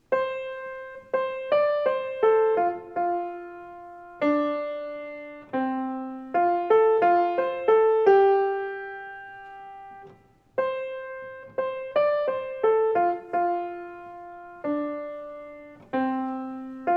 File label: Erie MELODY